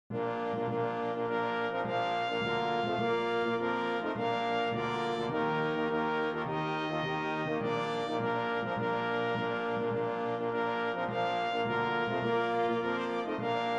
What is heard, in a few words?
Battle Hymn Brass